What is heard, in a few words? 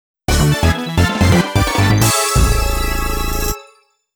1st Place in Team - New Record Fanfare; mario kart wii platinum soundtrack; 1st Place in Team (Battle); New Record (Time Trial) Fanfare